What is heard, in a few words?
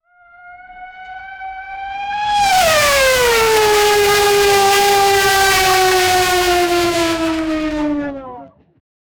high deceleration formula 1